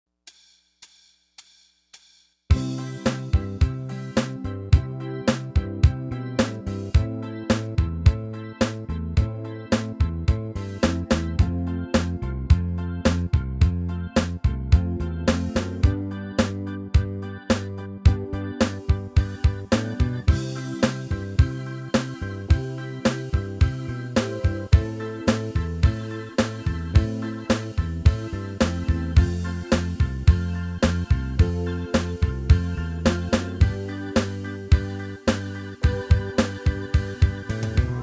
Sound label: Bass Line for a Rocker, bass guitar; Bass Guitar Methods Books